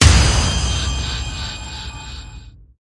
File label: Toll Hit Metal; Ninja Tracks